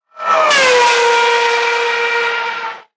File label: Ferrari F138